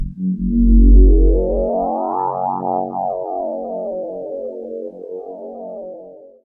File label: Rhodes Chroma Sweeper